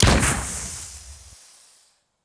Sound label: gren explo; impacts explos; dioxis